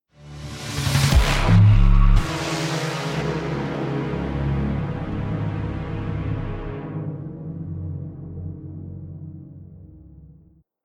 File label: lost round, music